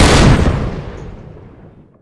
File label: big gun shoot, weapons; tf2classic